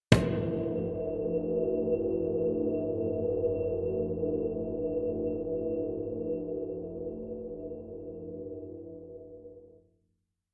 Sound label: duo for cajon and computer